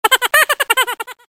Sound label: laugh